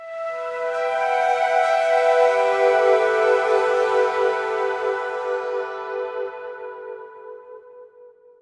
Synth Emin

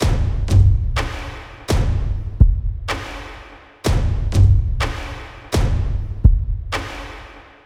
Drums Only; The Run; New Hope

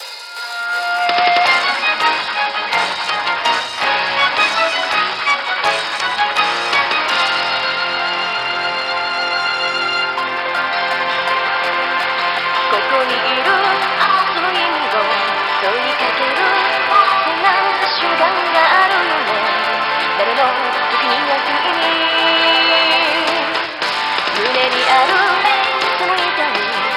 Pop Vocal; Phantasy Star Online 2; PS Vita

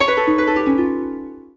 Bonus Success Jingle

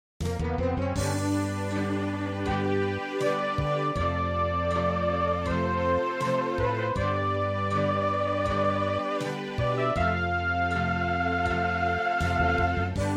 MIDI Scene

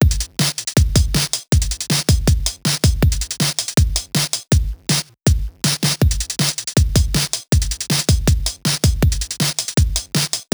Pause Music; Rad Rodgers Radical Edition

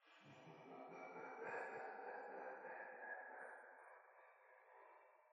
laugh; The Joy of Creation Halloween; Verblaugh 3

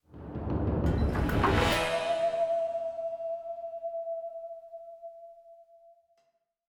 Mystic Forest Action 140

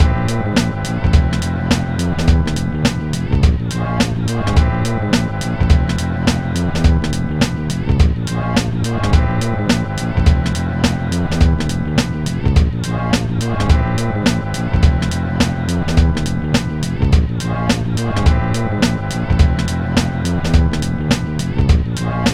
Load Loop; NBA Street; GC; PS2

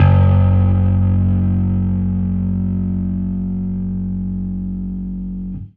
Fuzz F sharp 2

